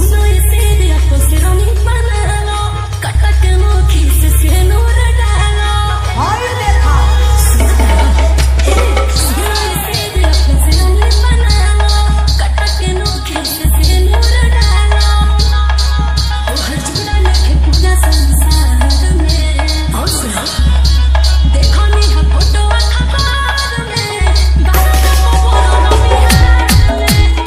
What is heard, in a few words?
Hard Vibration Bass Mix, Hindi Dj Song; Bhojpuri Song; Dileep BaBu Hi TeCh